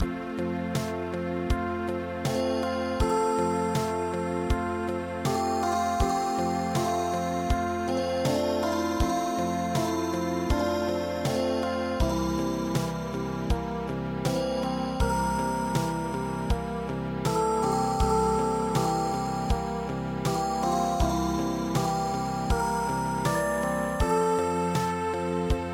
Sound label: Ballade